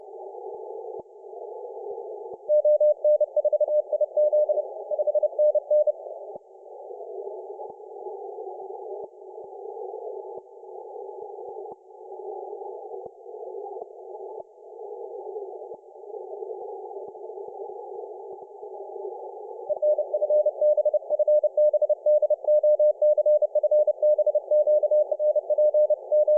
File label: mauritius on 30m cw; g3txf